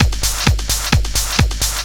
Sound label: groove, DRUMLOOPS